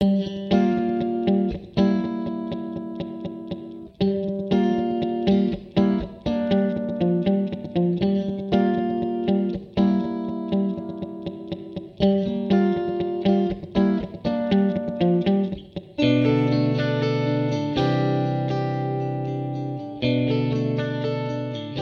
Eventide Instant Phaser Guitars; Eventide Model H910

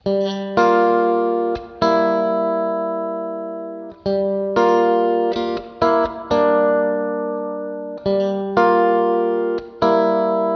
Guitar Chords Dry

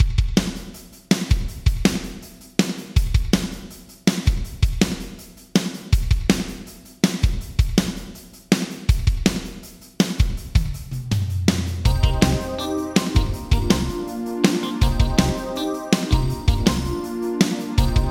Drums Yamaha ProR3 Droid Short Vintage Digital

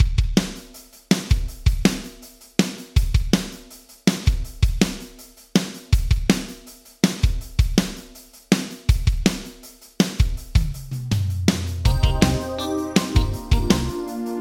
Drums Yamaha Rev7 ER1 Mode 2 Vintage Digital

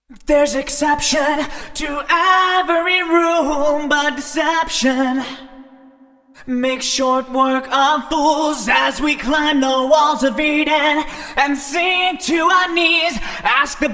Vocal Wet

